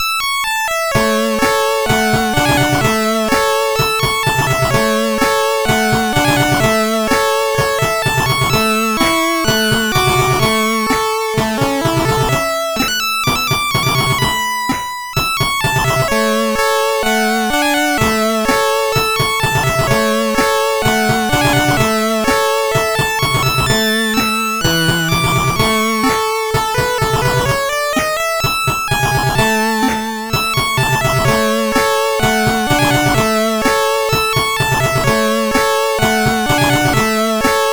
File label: Remix, sid CSG8580R5